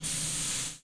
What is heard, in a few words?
Air Zero, train; Z8100; OpenBVE